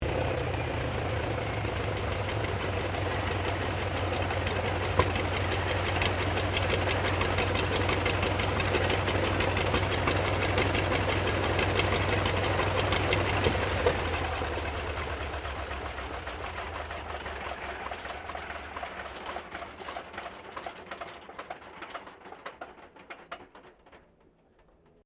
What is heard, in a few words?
AIRCRAFT CA-28 CERES IDLE SHUTDOWN; Airplanes; Vehicles